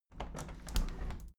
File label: window handle plastic squeak close soft; objekte; fenster